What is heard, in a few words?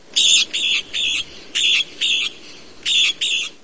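annoying whistle of a small parrot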